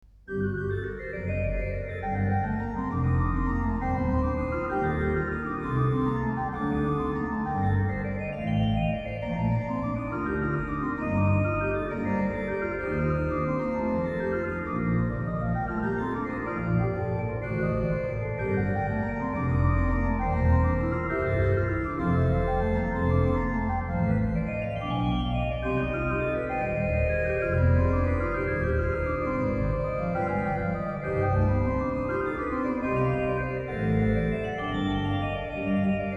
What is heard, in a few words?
variatio viii, Organ; Bach; Great Organ Works 3